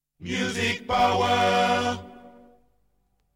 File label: Jingle